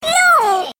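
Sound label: combo break; Millhiore Lite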